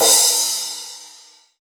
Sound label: Royality free crash single hit tuned to the D# note; D sharp key; Cymbal; drum sample